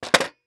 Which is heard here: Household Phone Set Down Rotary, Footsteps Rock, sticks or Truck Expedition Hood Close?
Household Phone Set Down Rotary